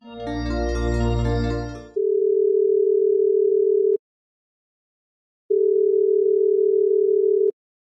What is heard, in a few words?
incoming